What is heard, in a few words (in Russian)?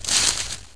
kust; de pripyat; spawn